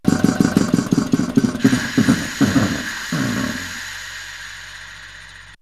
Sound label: Ignition Off!; X-Static Goldmine